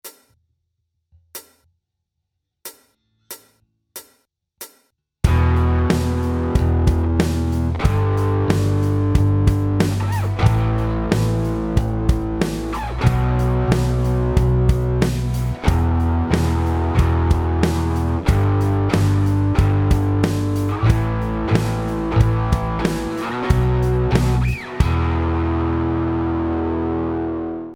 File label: Rock n Roll, gitarren